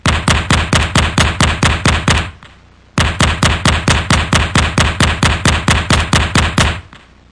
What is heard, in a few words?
machine gun